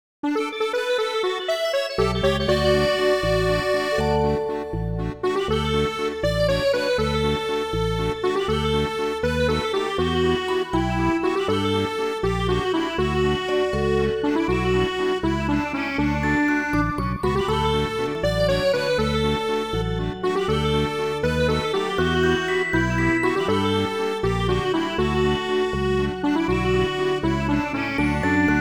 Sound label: Inquisitive Waltz; Klonoa Door to Phantomile